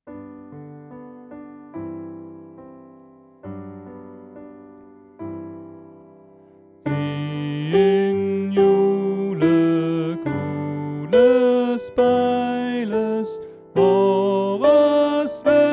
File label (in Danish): Sopran; oevefiler